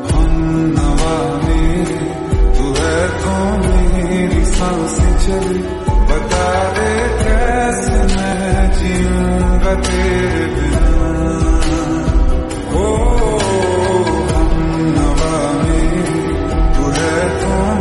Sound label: Lofi Remix, ringtone